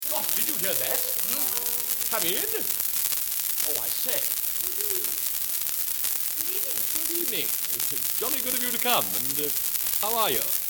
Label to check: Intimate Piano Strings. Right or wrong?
Wrong